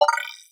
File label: add To Cart